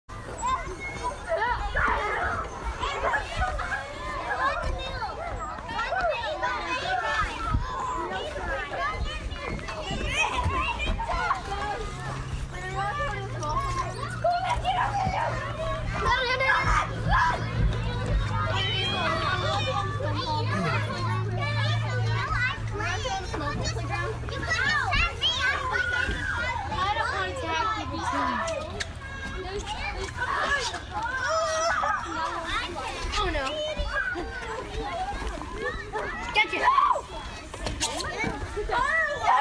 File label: kids playing